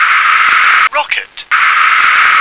rocket

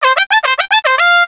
goal end

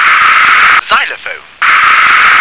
xylophone

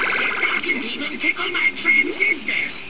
TICKLE; The SoundBlaster Multi Media Experience vol 4

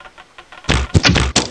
BOW SHOT

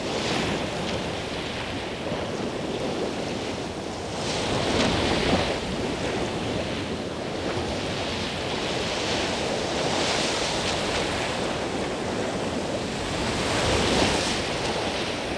WAVES

